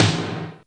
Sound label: Snare, Alesis D4fx